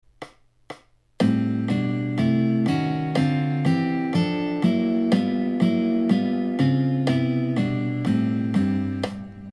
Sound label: jazz bass chord